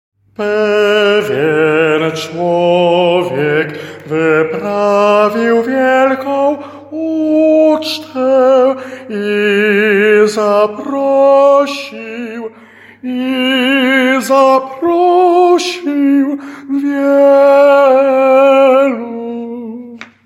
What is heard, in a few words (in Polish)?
SOPRAN